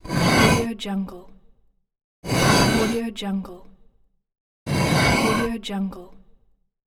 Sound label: stone sliding